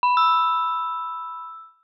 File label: door bell